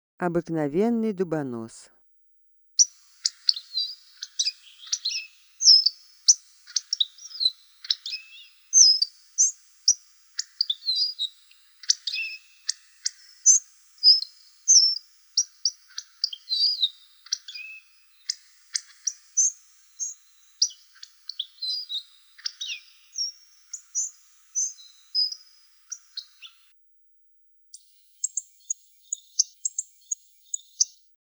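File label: obyknovennyy dubonos golos, hawfinch voice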